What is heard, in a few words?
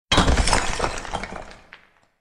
Bricks Hit